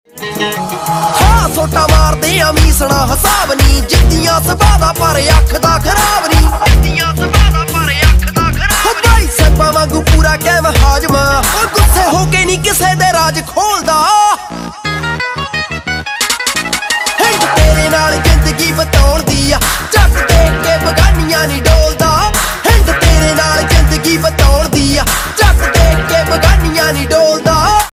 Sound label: Dance Punjabi Ringtone